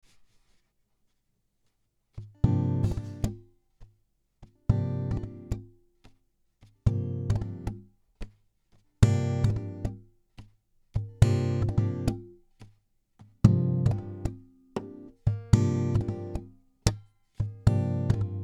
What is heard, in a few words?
Gm110 Acoustic Groove